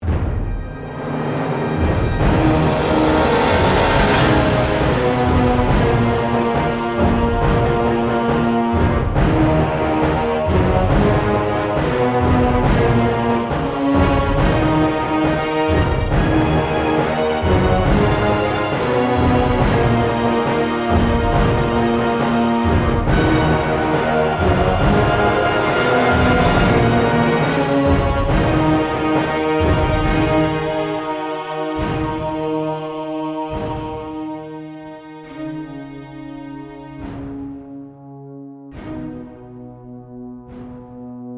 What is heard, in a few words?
Action Suspense Drama Production Music